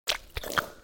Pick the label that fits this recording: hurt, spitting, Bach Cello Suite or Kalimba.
spitting